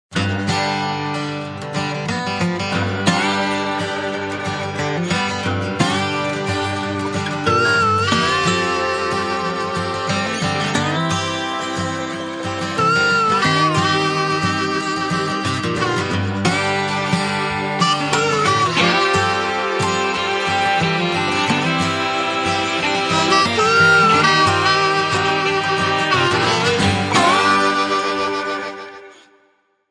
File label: nokia twang